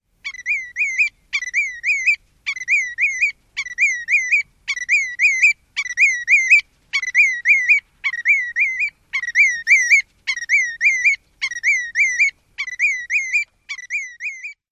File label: song